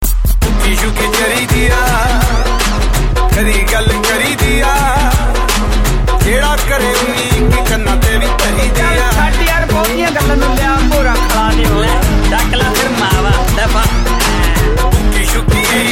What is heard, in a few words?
punjabi ringtone